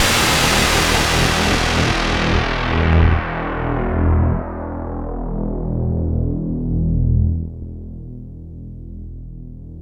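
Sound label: noise sweep, Roland MKS80; AMG; Now CD-ROM (Roland); NOW! FX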